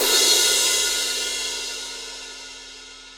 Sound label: Crash